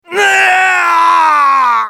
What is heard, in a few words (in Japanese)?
死亡; カート